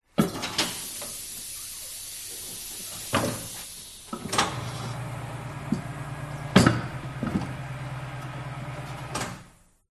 air compressor working air release long burst metal clunks mechanical; mechanical movement; Sound Ex Machina